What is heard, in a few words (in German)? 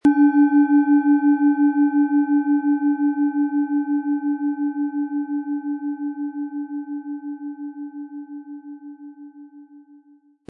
Planetenschale